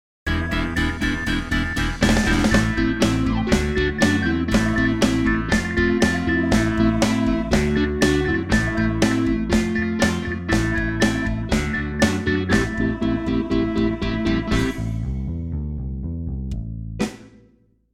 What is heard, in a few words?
Hohner pianet n; pianoteq; Modartt-Pianoteq; Hohner Collection